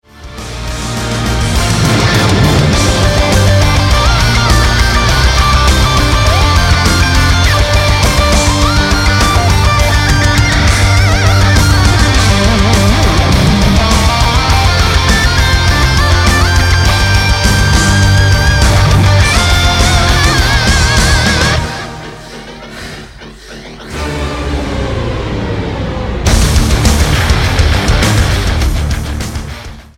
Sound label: instrumental